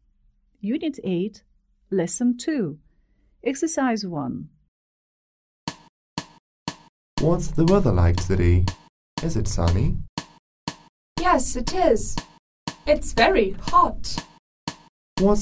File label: chant